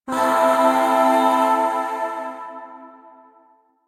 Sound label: Shawzin Pent Maj Chord I; Music; Chords; Warframe; Zariman Void Shawzin; DE